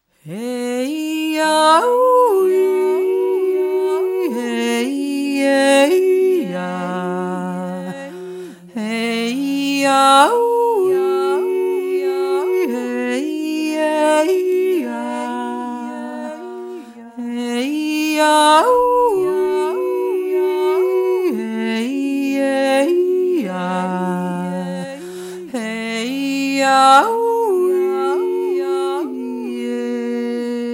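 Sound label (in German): Eisschützenjodler Kanon 1 Stimme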